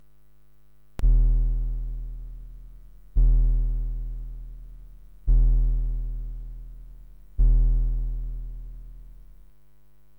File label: SIDKick Pico with DAC 8580 digiboost; Clean rendition. A click (as expected) can be heard at the beginning, when the volume is set to 15.; SID replacement; firmware 0.21